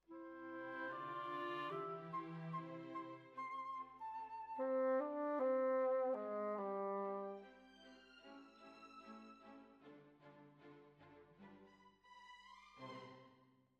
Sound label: Bassoon, Jazz for piano